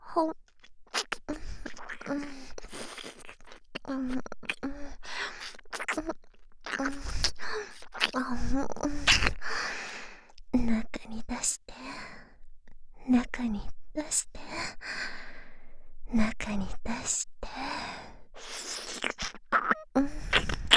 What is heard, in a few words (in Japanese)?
中に出して 耳舐め; ハーレムオナサポ 4人のメイドがご主人様のオナニーをお手伝いするバイノーラル～文、千曜、名月、莉桜～